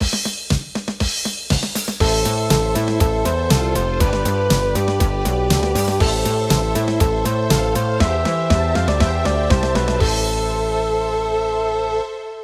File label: Victory